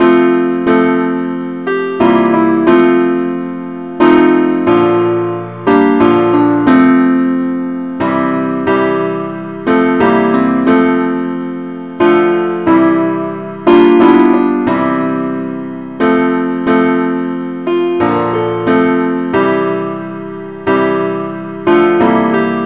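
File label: simple accompaniment key f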